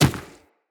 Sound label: Infested Medium Run Dirt, Footsteps